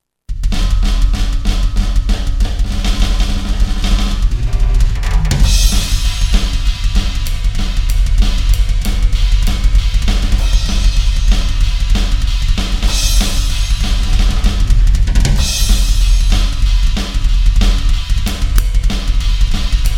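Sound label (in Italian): Rock a due colpi di doppia cassa; Double Bass Experiment - Rockacci a 2 colpi di cassa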